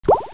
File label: PLOP